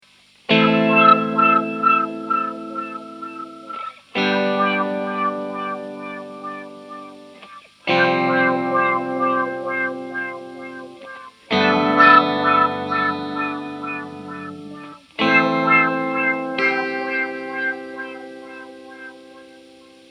lowpass shimmer; reverie

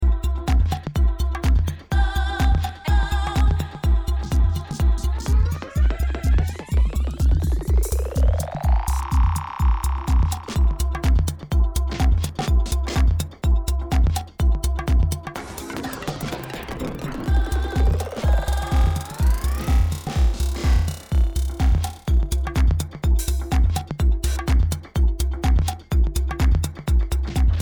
DJM 900NXS2 Helix

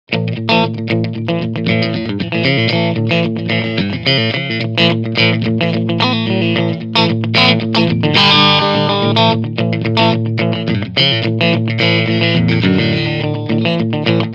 Clean Channel

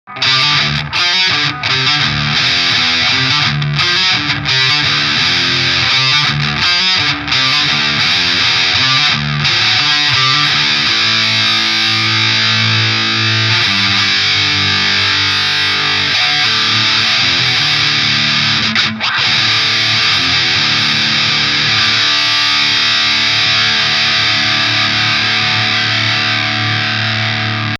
Electro-Harmonix Nano Metal Muff Distortion; Tr. 4h; Mids 11h

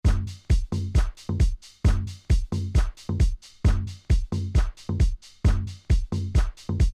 Ortofon Concorde Club MKII

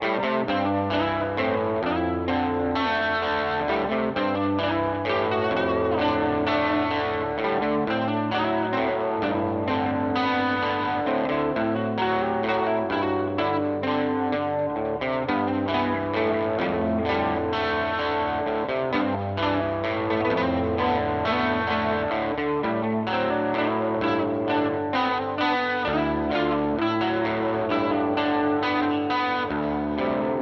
Headrush MX5; Purple Bike

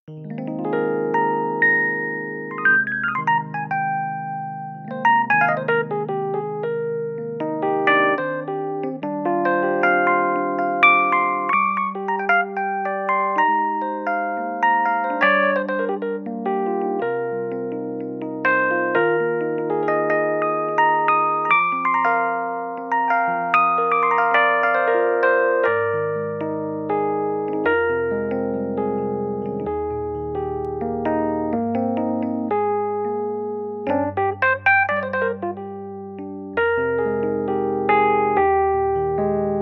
Nord Grand Rhodes MkI Customized

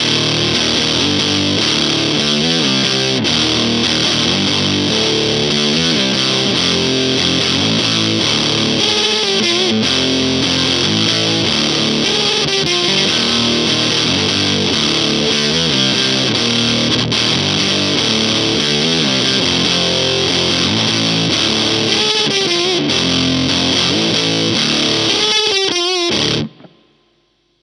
JHS Cheese Ball Mode 2

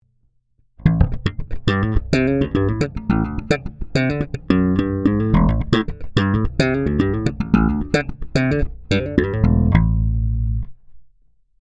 Ibanez EHB1505 Neck Bridge passive slap

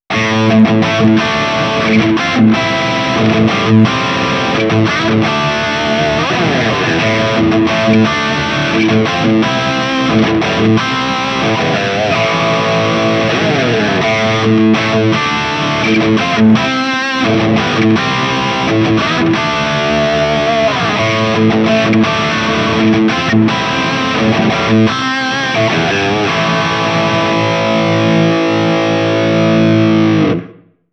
HiGain; Harley Benton DNAfx GiT Pro; Dizzy V4

